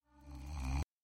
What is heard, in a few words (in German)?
Vater Martin Kanon